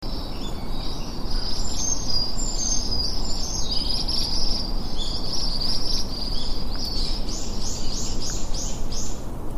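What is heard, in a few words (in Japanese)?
sannsyoukui, ashy minivet; nodayama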